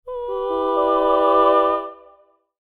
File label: Heavenly choir swell